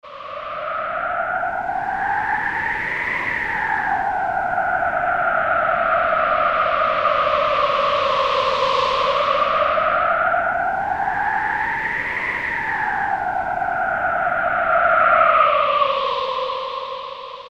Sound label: Sfx Windy City, Alesis Micron; Factory Programs